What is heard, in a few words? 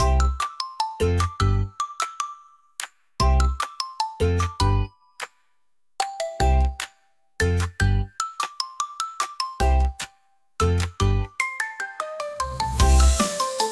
jolly vibes; freepik